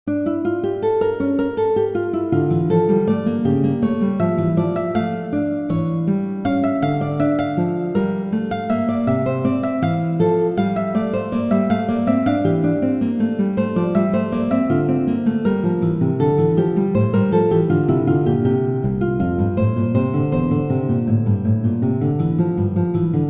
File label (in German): der fliessende Gitarren Bach